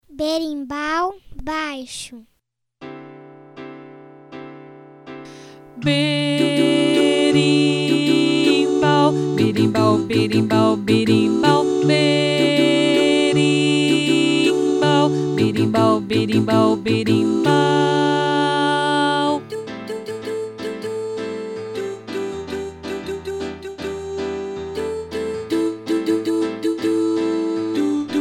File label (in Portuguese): berimbau naipe baixo; 4 VOZES